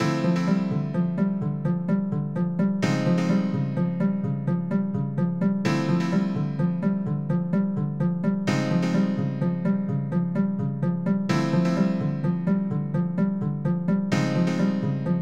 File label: Battle Menu; golem no maigo; dreamcast